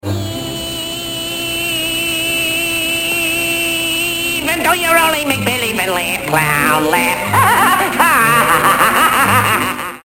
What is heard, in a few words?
laugh